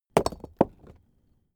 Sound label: stone small impact; natur-tiere; steine